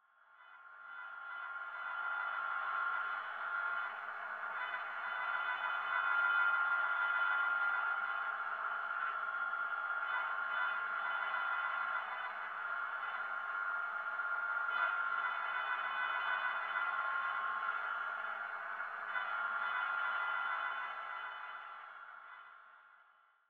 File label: Radar Recording